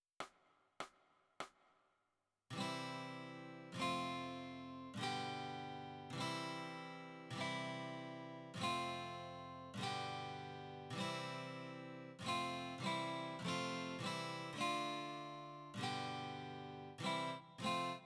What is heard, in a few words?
Guitar